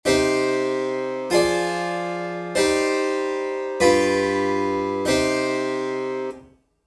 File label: neapolitan ii0